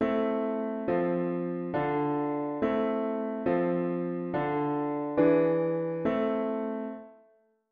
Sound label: root V7 bass